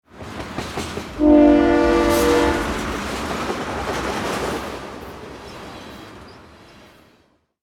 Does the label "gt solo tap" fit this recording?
No